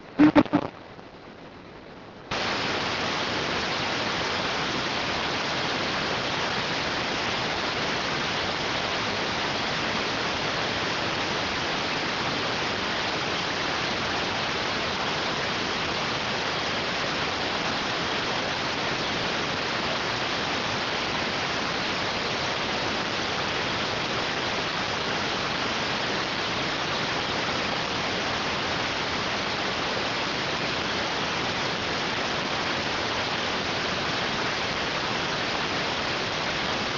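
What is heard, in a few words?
radioteletype receive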